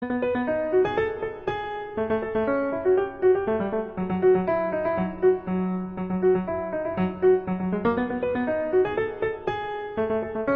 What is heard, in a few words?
Piano; Bomberman